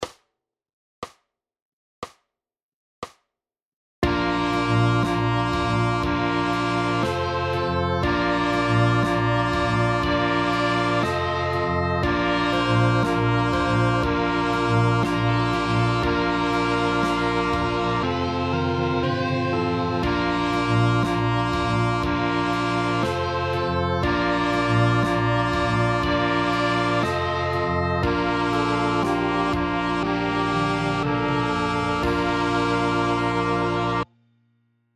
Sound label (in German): first song trompete